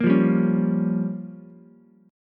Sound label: G Minor 7th Flat 5th, Ukulele; Gm7b5 strummed; JGuitar